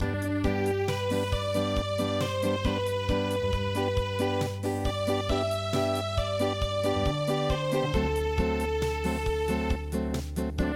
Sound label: Gleboka Studzienka Unplugged